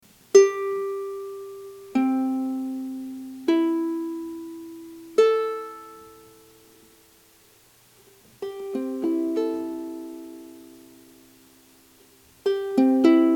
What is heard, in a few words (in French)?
ukulele GCEA cordes a vide